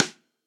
Snare Sidestick Old School; Drum Hits; Session Drums Studio; Standard Hits

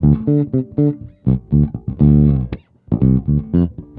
120 Pop 70s Bass Dm, E-Bass; Pop Rock

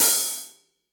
Hihat Open Big Punchy; Drum Hits; Ableton; Factory Packs; Session Drums Club; MPE Hits

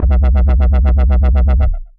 Sub Wobble, Bass; The Forge by Hecq; Ableton; Factory Packs

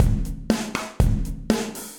Kit Low Loud Squashed, Drums; MPE Kits; Ableton; Factory Packs; Session Drums Club

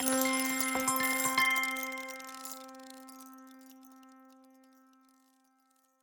Lost Keys; Fleamarket Jam

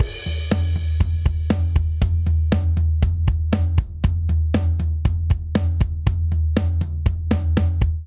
Classic Rock Breakdown 119 bpm; 4 4; MIDI Clips; Ableton; Factory Packs; Drum Booth

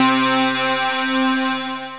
Bells, Wavetable, Synth Keys; Instruments; Achilles Bells; Ableton; Synth Essentials

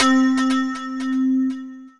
Dulce Delay, Mallets

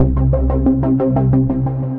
Saw Plus Pulse, Synth Rhythmic; Ableton; Factory Packs; Synth Essentials

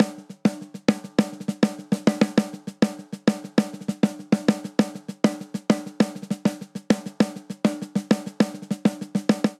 Reggaeton Snare Only 100 bpm; 4 4; MIDI Clips; Ableton; Factory Packs; Drum Booth

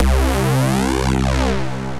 Bass; Slippery Slope; Ableton; Build and Drop

